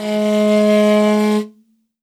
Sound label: morin khuur, g#; World Instruments 2; PS MORIN KHUUR